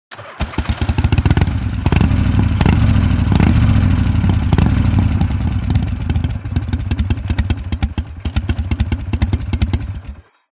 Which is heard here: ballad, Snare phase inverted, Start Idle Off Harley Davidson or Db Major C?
Start Idle Off Harley Davidson